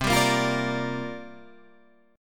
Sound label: C 7th Suspended 2nd Suspended 4th C down Guitar Standard; C7sus2sus4 strummed